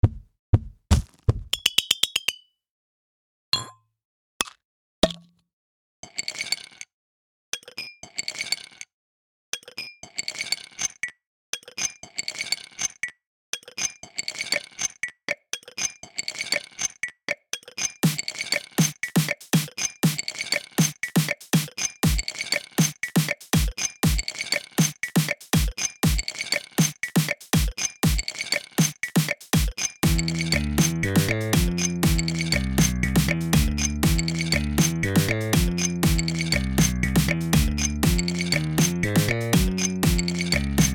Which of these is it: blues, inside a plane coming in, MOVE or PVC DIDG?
blues